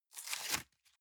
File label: box small tear apart medium; industrie wirtschaft; bueroarbeit